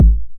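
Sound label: 909 BD tape, kick drum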